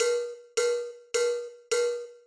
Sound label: Boom Chocka Chocka Cha; Zenboy1955